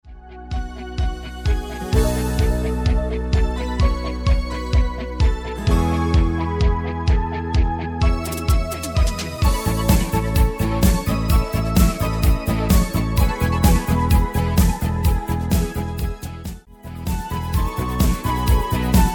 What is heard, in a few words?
Discofox Hitmix